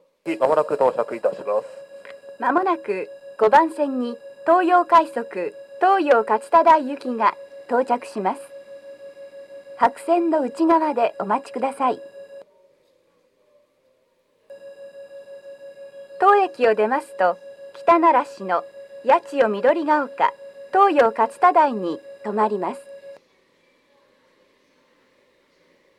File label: nishi funabashi toyo rapid toyo katsutadai, station